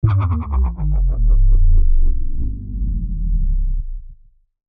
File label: Fluttery Downer